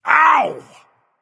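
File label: nonverbal ouch; smite; voicepack; merlin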